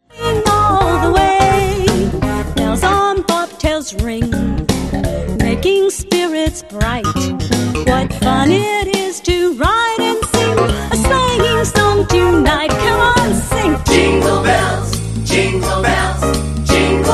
jazz and jingles jingle bells

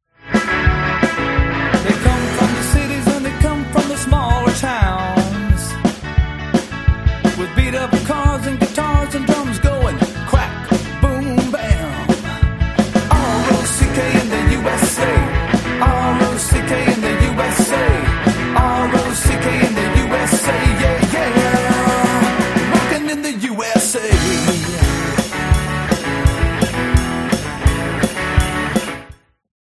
play along drums classic rock

